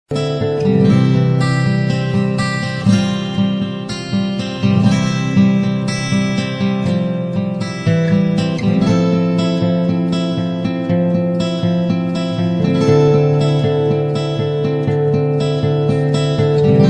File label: Acoustic; Rock